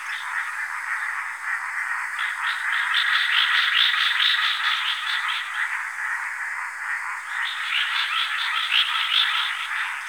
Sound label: Frogs Loop; Ambience; Props; Warframe; Eidolon Plains